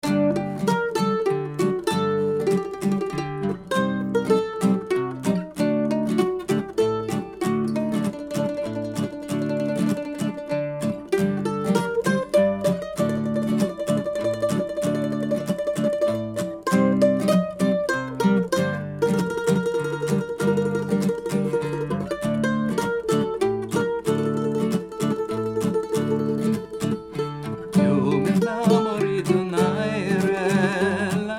sephardic duet

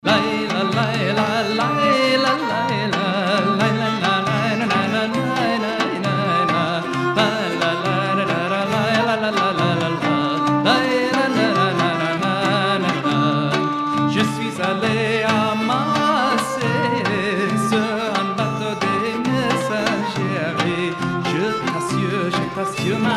sephardic french trio

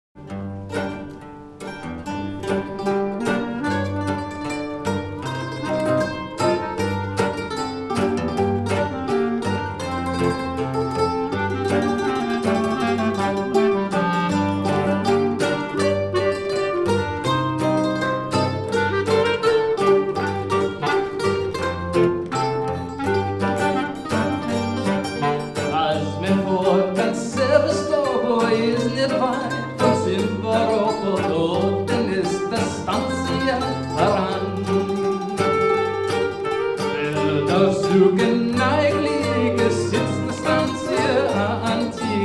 russian yiddish quartet

